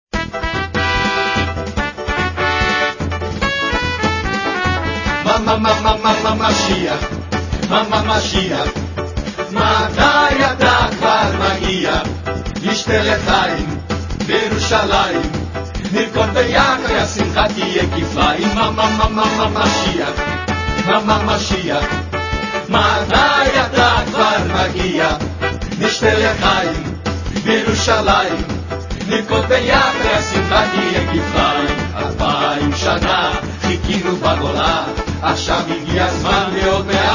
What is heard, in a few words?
song samba